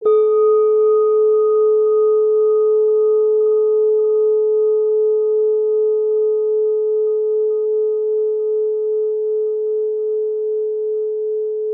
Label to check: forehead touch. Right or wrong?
Wrong